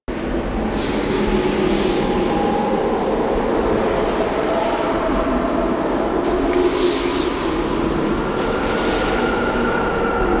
Shipyards Ext Movement Amb; Ambience; Exterior; Warframe; Grineer Shipyards

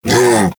Predasite Vocal Tranquilized Grunt; Warframe; Hunting Critters

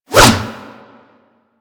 Staff Swing E; Warframe

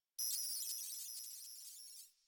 Computer Room Ambient Beeping; Warframe; Ambience; Nineteen Ninety Nine

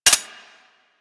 Uzi Mech; Weapons; Warframe; Tenno

